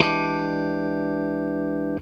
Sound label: FUNK CHOR, Funk Gtr & Wah; PlatinumPhattCD2; E-MU Producer Series Vol. 8 – Platinum Phatt